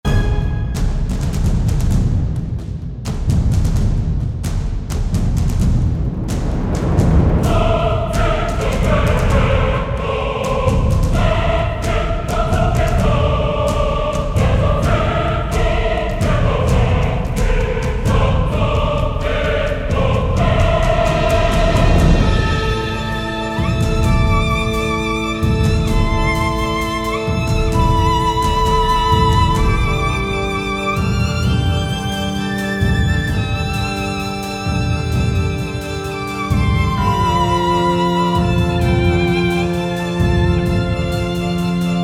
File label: loop; protecting neverwinter; ivan duch music packs